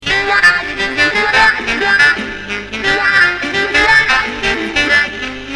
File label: sax section